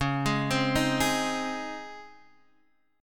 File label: Db Major 7th Suspended 2nd Db Guitar Open D